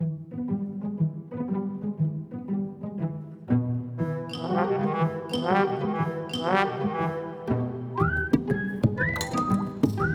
Silly Ambient

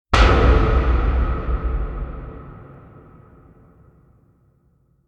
Hit Metal; Ninja Tracks

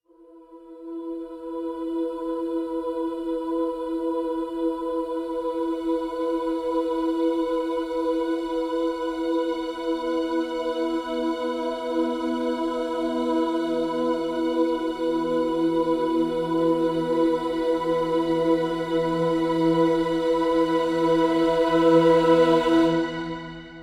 String (E); Brand X Music; Brand X Music Catalogue - Editor's Essentials

